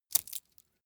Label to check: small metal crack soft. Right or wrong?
Right